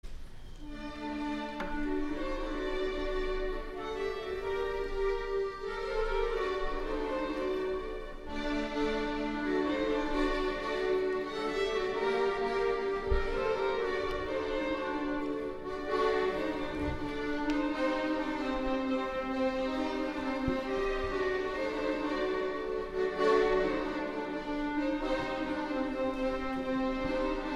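Folkensemble Hava Nagila (kept: "Hava Nagila" is a traditional song)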